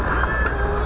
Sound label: beluga